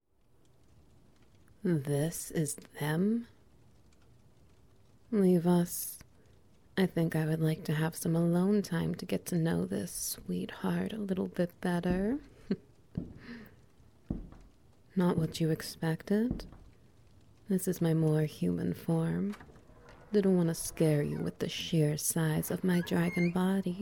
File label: Ambience SFX